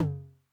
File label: Tom Hi Mid Tune Max Decay, 909